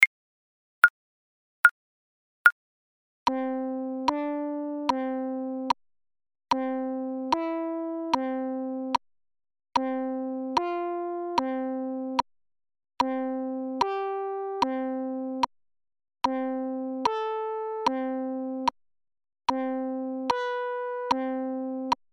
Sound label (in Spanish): Entonacion Intervalos Escala Mayor Saltos